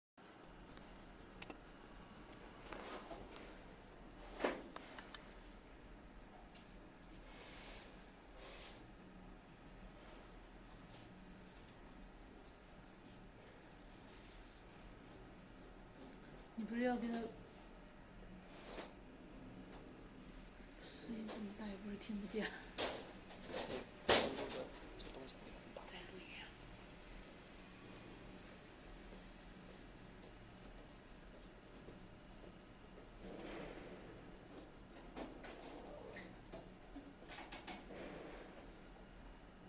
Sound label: Fault signal